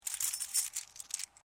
Coin pennys movement, Old Australian pennies; Money; Objects

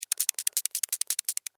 Insect Walk Move, Insect, Walk, Movement, Bug; Sound Effects:Animals:Insects; Blastwave

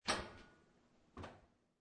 Washing Machine Door Open

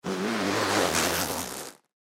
Clothes Jacket Bubble Vest Vinyl Zip Up; Dressing Sound Effects; Human; Foley

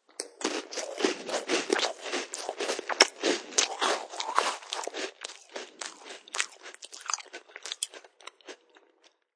Chewing a cracker; Eating; Human; SFXsource

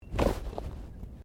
Body movement Clothing Foley

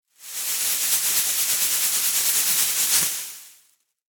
Pom Pons Shake Cheerleader; Sports; Football

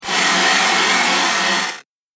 Static; Ghost Sound Effects; Horror Sound Effect

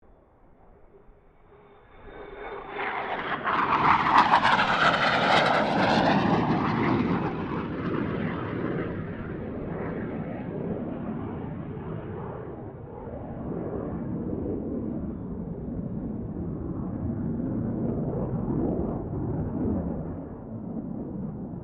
AIRCRAFT FA-18 HORNET PASS

